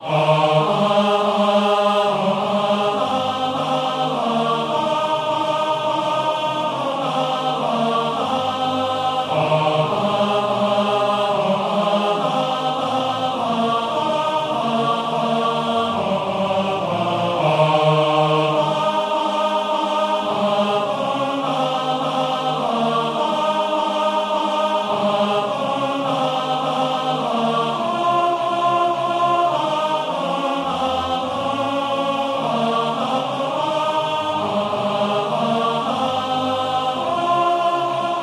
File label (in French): Tenors Hauts